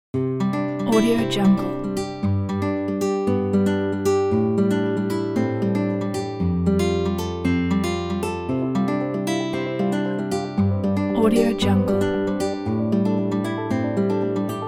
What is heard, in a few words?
inspiring acoustic